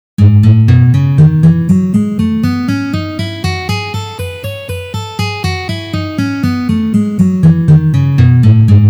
Gamma Mi mazhor Tri noty pozitsiya

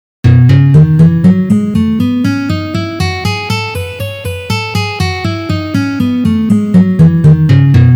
Gamma Mi mazhor 1 pozitsiya

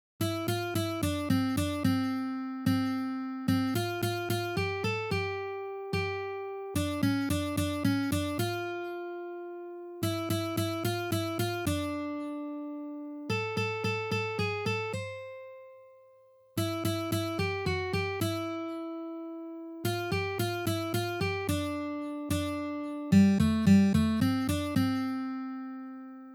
Kolybelnaya na gitare

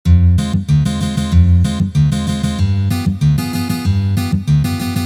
Boj

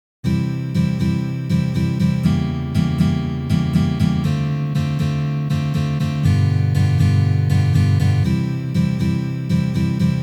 Progressiya s dim akkordami

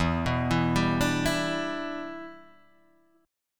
akkord em7 perebor

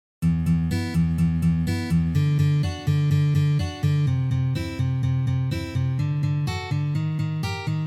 razbor

